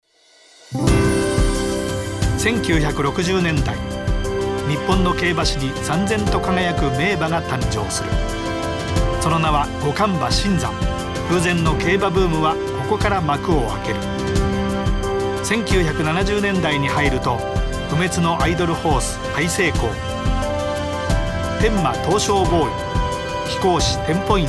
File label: opening event; winning post 8 2015 ps vita gamerip 2015